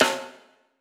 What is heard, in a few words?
hiphop snare, Effect on